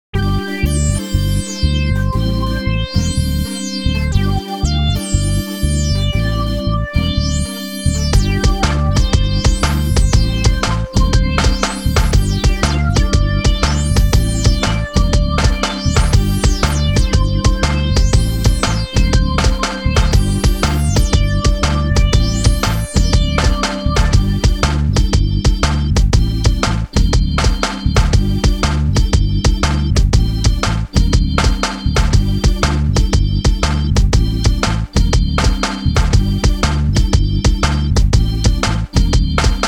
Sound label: Rap Beat Version